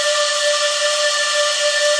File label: shakuhachi loop; Flutes